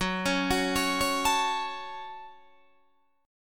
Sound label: Gb Minor, Guitar, DADGAD; Gbm arpeggiated; 16,16,16,18,16,19